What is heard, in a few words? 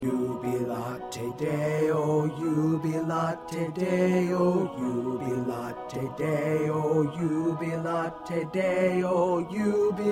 Bass 2